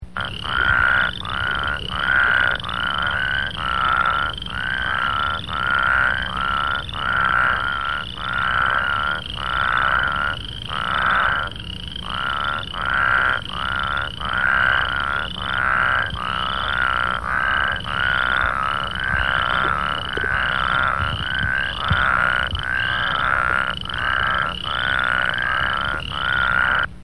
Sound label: Plains Spadefoot; spring